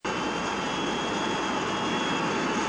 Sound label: engine; space pew